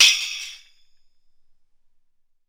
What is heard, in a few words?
soft sounding open hi hat d sharp key